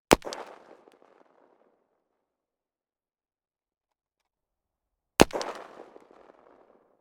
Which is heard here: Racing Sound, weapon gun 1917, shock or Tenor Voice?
weapon gun 1917